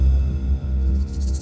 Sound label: bassy shaker; 4am Doom Scrolling; BB 2HTC Samples Vol 4; Weird